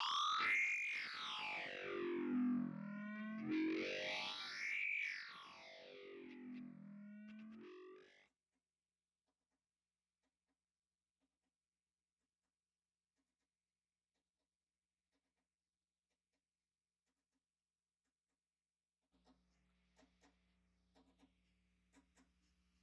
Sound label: guitar